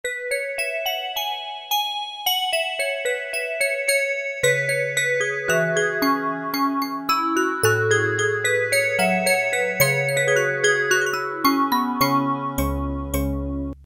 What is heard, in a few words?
Glock Vibes, Roland MKS-70